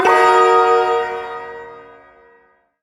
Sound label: Shawzin Blues Chord; Blues Chord G; Warframe; Duviri Ersatz Shawzin; Chords